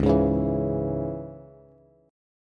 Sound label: Db Minor Db down Bass; strummed; JGuitar